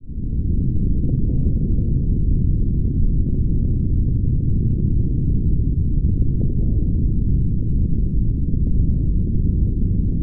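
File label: spaceship hum; spacecraft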